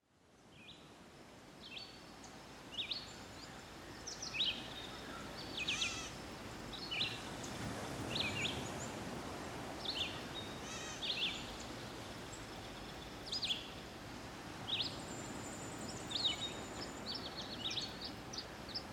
Surround seaside garden birds Stereo Downmix, A very smooth ocean surf